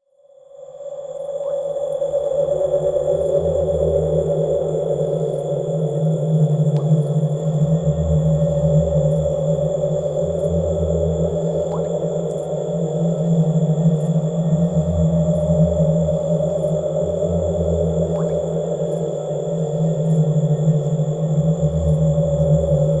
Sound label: eerie night ambience; fvtt sonniss gdc audio